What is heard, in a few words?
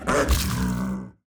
Attack; SFX; SwftJag B; These are the draft sounds for their attacks